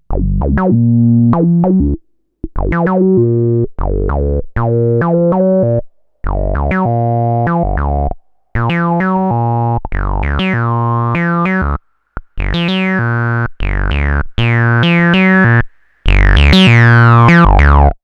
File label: Liquid Foam Loop; HerbsAndStones